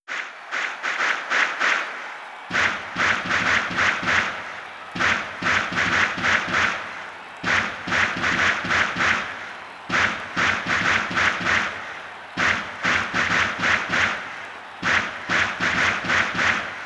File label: Spectator Chant; heat of eleven 98; arcade